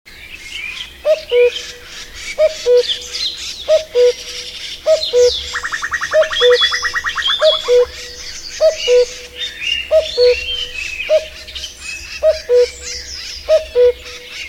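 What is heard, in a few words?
Cuculus canorus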